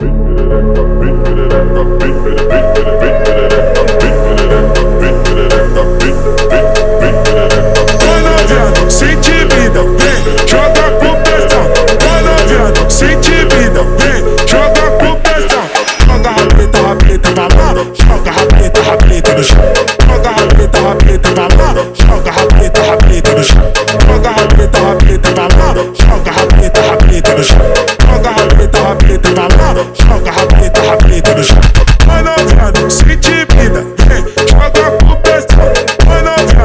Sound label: dream funk slowed